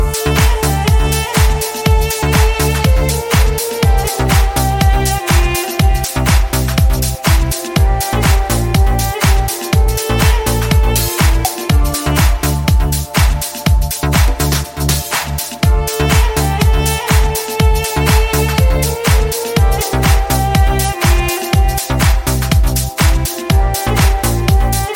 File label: Apple Romantic Ringtone